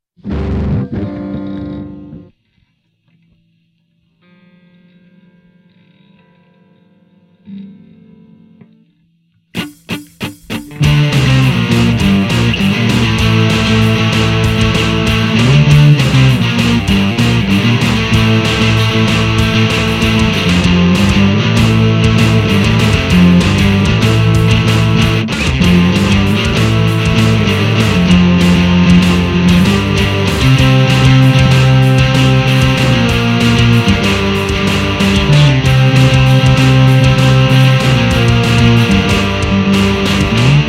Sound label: Cheesy Keyboard